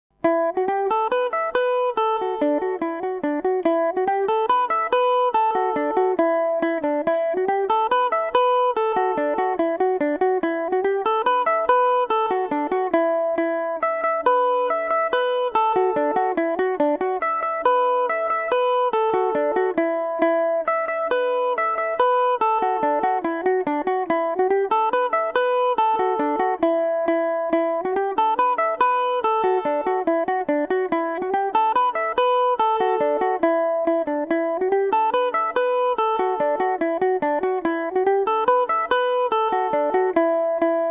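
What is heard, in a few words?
E dorian revelation, Irish mandolin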